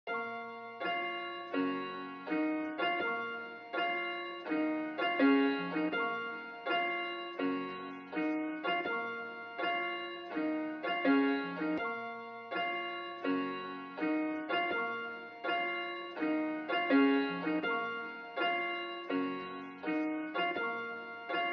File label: Instrumental